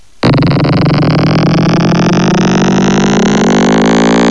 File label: ACCEL